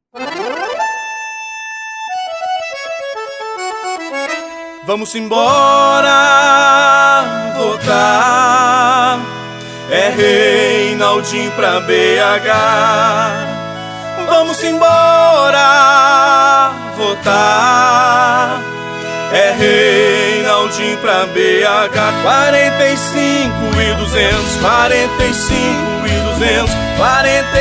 jingle

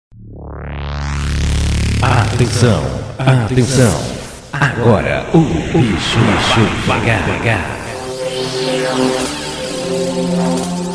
abertura

